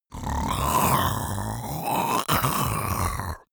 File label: monster choking zombie idle; comedy-cartoon; soundpool